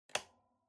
Electric Fan Switch Swivel

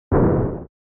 SNES Fighting Fire

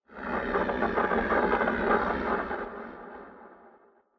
Horror Accent, High Long

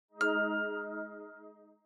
Smartphone UI Sound Dry

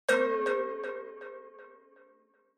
Onoma Negative Delay; オノマトペ ネガティブ01-2(ディレイ); 効果音＞オノマトペ・ネガティブ

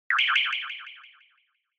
Accent

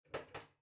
Ceiling Light (Far Pull)

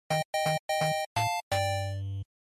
NES Action Clear

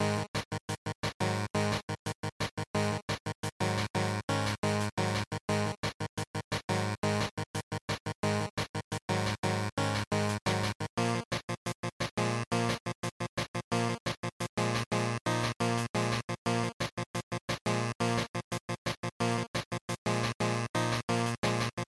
GB Fighting Title Loop175

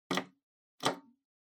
Public Phone Button Emergency

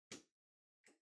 Light Switch Wide Panel, Far Dry Gentle

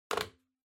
Calculator (Desk Tilt)

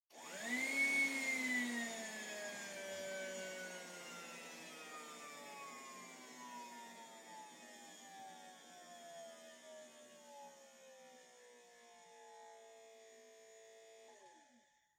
Vacuum Cleaner Far Battery Died

